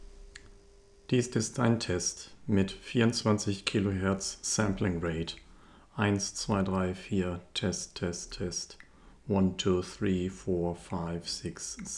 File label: test 24kHz